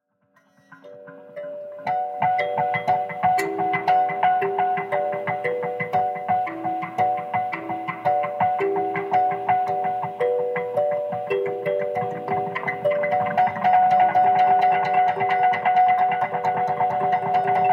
Kalimba

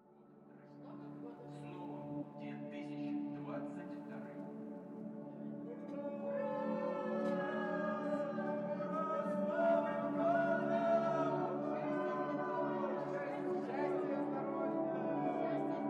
Chimes

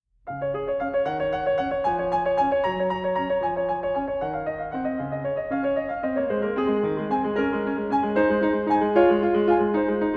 Prelude and Fugue in C-Sharp Minor

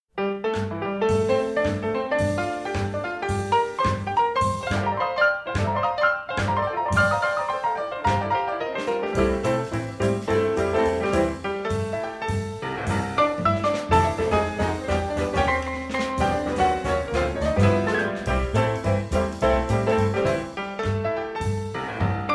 Strings Orchestra

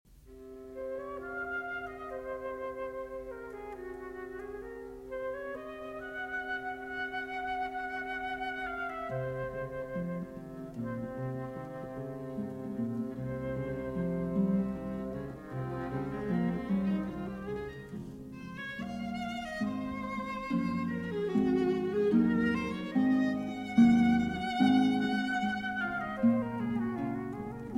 Sonata For Flute, Viola and Harp Interlude

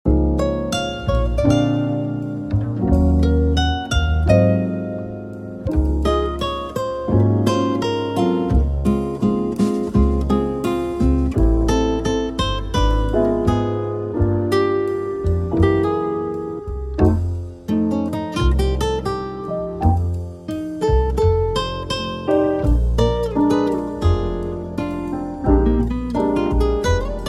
Smooth Jazz Music

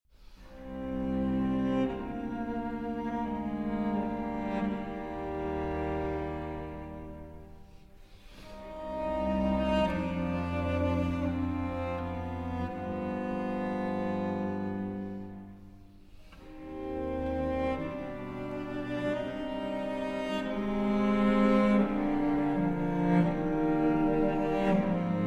Arr. For 5 Cellos; J.S. Bach

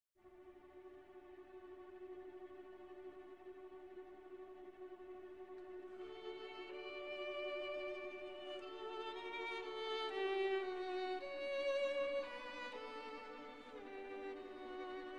Violin Concerto No. 1 in D Major, Andantino

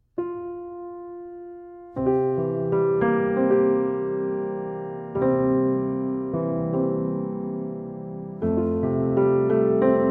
The Muffin Man (Instrumental Version)

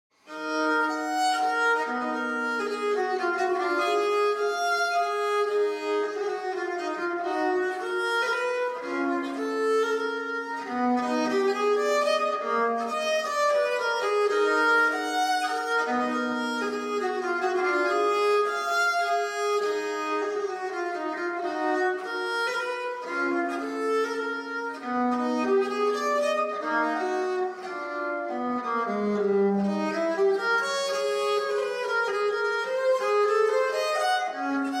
Viola da Gamba, Vivace; Essercizii per la Viola da Gamba No. 9

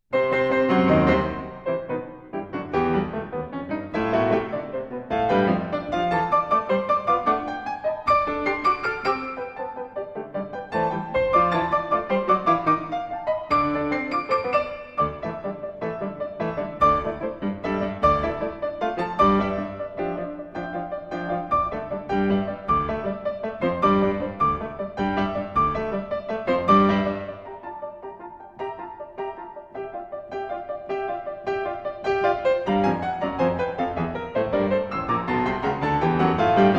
Kaleidoscopes For Piano, Vivace Con Brio; Onovwerosuoke; No. 5

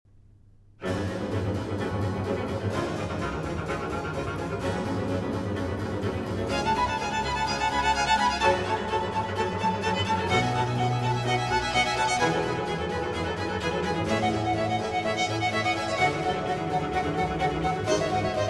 Flute Concerto in G Minor

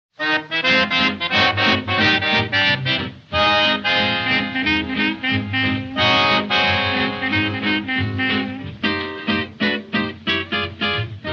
Accordeon Swing Quartet

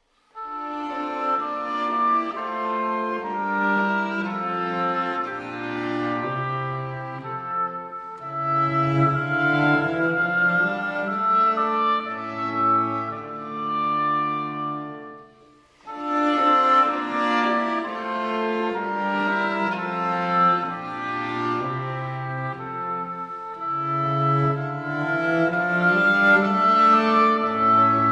Arr. for Oboe Quartet; St. Matthew Passion; No. 63, O Haupt voll Blut und Wunde

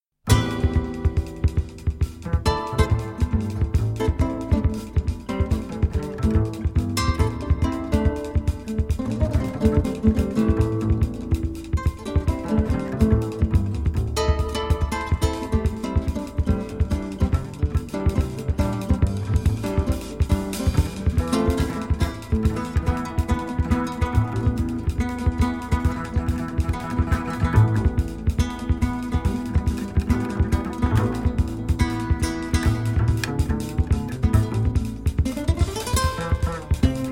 Samba

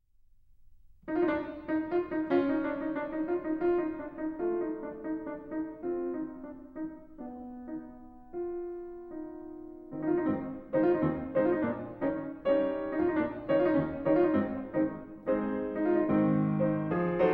Polka in A Flat Major

